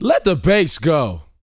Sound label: VOX F, Vocal Planet R&B & Dance HIP-HOP; ILIO